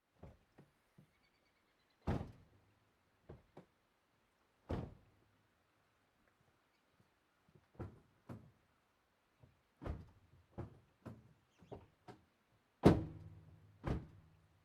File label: car door